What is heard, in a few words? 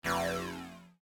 projectile; Smite; god Janus